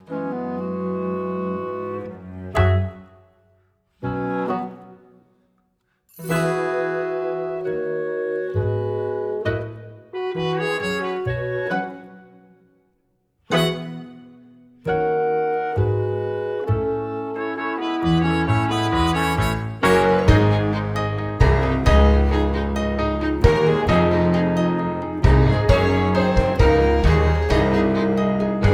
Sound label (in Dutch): Instrumentaal